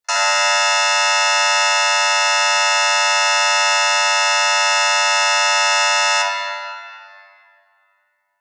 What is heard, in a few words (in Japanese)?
劇場やホールの開演ブザー 長め リバーブ有り; 演劇・舞台